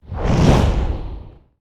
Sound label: Wizard Firestorm Whoosh; Battle